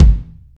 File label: phat kick single hit g sharp key, bass drum sample tuned to the G# note; kick drum samples